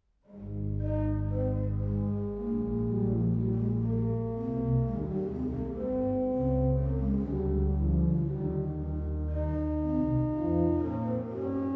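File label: Trio, organ; Hinsz; Kirnberger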